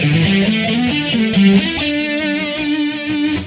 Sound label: E5 134 rhythm; Loops